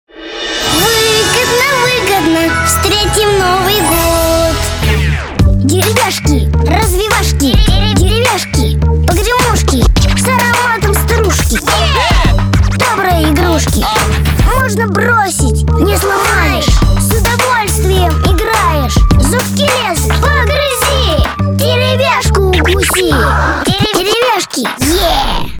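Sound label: vocal rap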